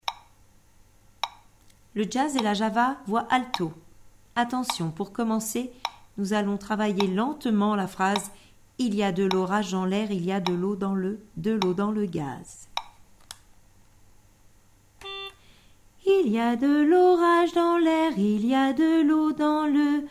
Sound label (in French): alto